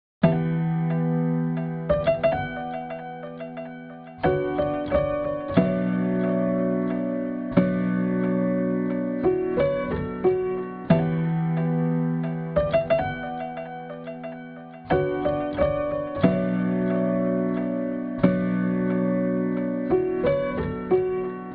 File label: 90 BPM B Min Piano; Cymatics; Destiny Gold Expansion Stems; Intermission